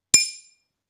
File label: glass